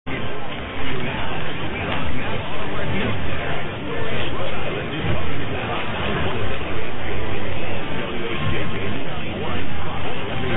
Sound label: Providence RI; 920 WHJJ